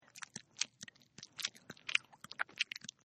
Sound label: Chew Gum; Eating; Human